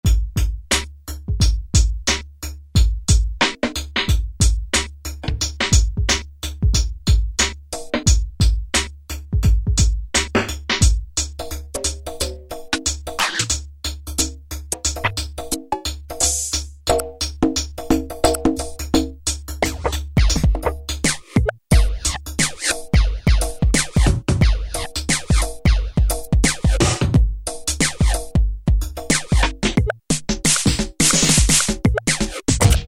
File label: Drum Pile, E-mu Morpheus; Factory Presets